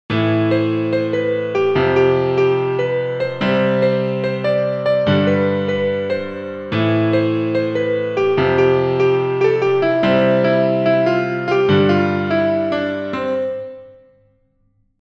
Piano After

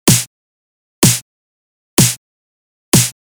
Snare

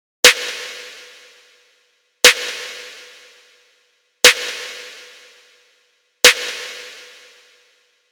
Snare Wet

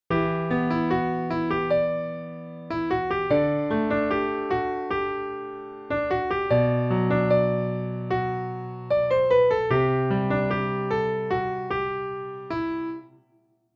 Piano

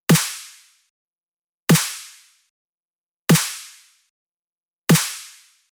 Snare A

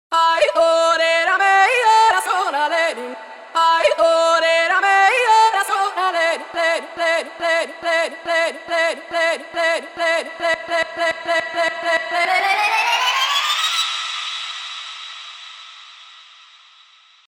Buildup

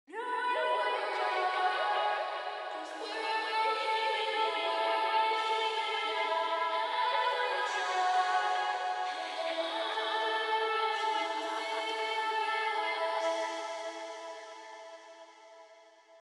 Only reverb delay

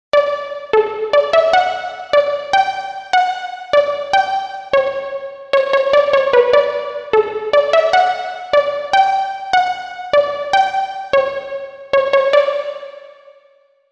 Pluck; Spring

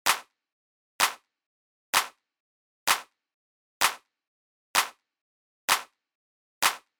Clap

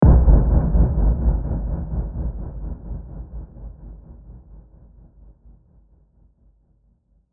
Impact Tremolo

